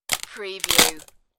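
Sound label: Thin Board Splinter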